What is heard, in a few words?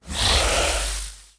thunder